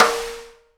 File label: snare RIM RING, Live Snares; E-MU Producer Series Vol. 8 – Platinum Phatt (CD 1)